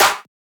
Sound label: clap, One Shots, Claps; Drum Sample Pack; straw; Slate; SEISMIC